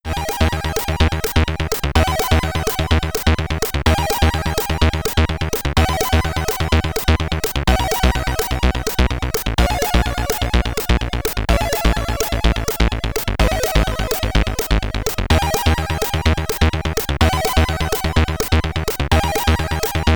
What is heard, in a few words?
Ending, SID Stereo, commodore 64